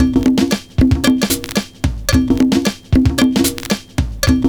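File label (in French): LA CLOCHE, 112 bpm; Univers Sons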